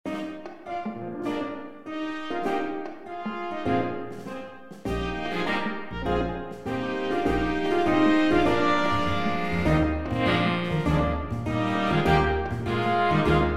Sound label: jazz ensemble